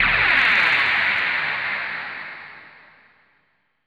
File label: hit noise; AKAI S6000 CD-ROM - Volume 5